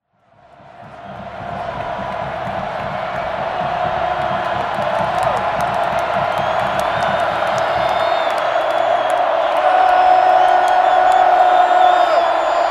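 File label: stadium hum and reaction to something; Звуки футбола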